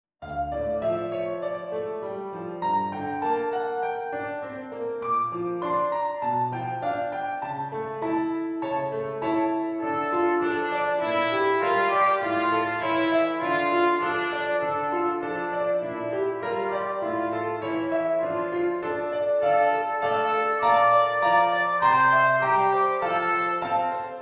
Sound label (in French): trompette et piano; musique instrumentale